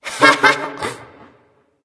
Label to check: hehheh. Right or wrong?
Right